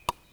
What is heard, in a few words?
Clave; Percu Bois